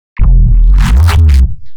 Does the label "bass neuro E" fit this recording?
Yes